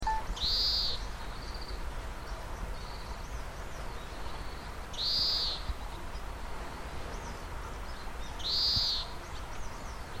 カワラヒワの声です（さえずり）。; 春の早い時期から囀りを始めます、地味な野鳥ですがカワラヒワの囀りです。; 毎年家の庭木に巣を作り子育てをしています

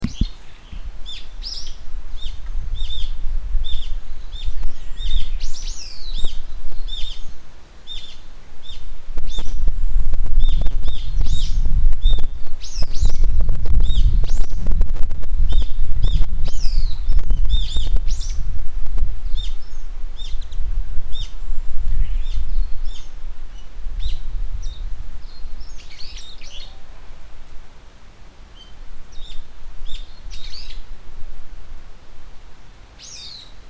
散歩の途中で録音したヒヨドリの声です。背景にかすかにウグイス、メジロの声も含まれています。; ヒヨドリの声をどうぞ。

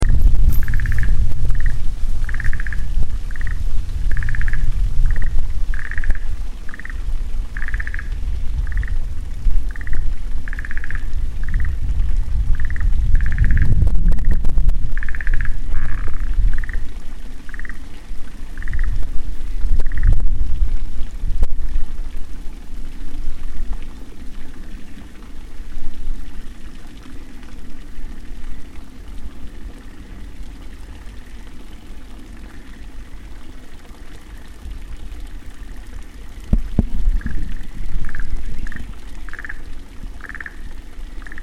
山すその水路から聞こえてきました蛙の声です。

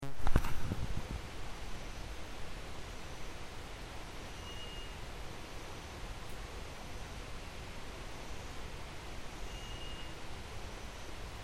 ヌエ（トラツグミ）の声です。; 「トラツグミ」という鳩より少し小さな野鳥です; 昼でも薄暗いような森で、霧が覆う朝や夜に、物寂しげに聞こえてくるのが「ヌエ」と呼ばれる鳥です。

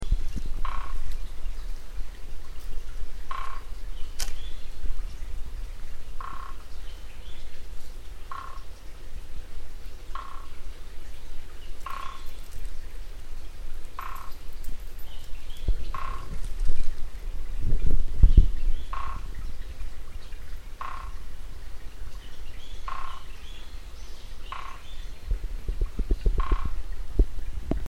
コゲラの単発的なドラミングです。; キツツキ; 我が家の近辺

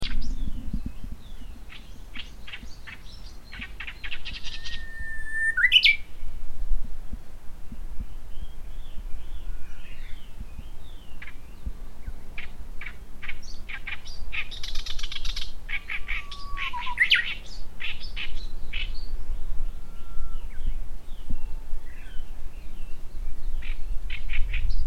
コヨシキリの声とウグイスの声です。; 散歩がてらに畑の様子を見に行った帰りに録音したコヨシキリの声です。ウグイスもすぐそばで泣いていました。

